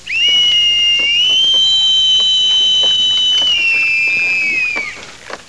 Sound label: pipes